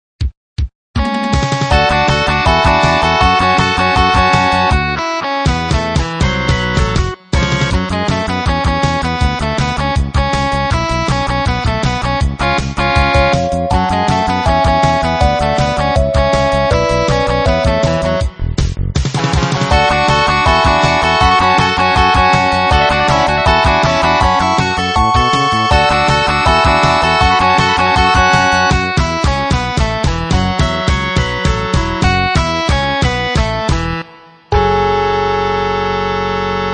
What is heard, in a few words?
rock